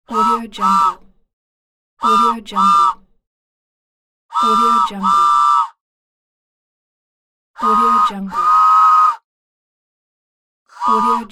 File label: toy train whistle pack; inspectorj